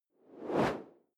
whoosh simple steady; swooshes-swishes

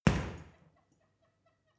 boxing punching bag hit wiggle; sport-freizeit; boxen

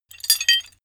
metal handling pieces

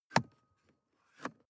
sports car int switch press and release; autos; verkehr transport

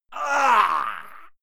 male fight pain; schreie; menschen; soundpool

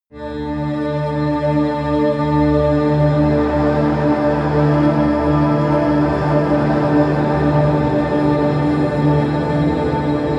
drone eastern drone

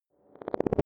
sci-fi flashback; swooshes-swishes

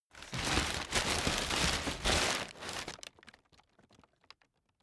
stuffing paper put in

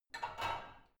barbell only replace unsteady soft; sport freizeit; fitnessstudio

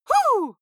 female fight "huh"; vocals; comedy-cartoon; soundpool

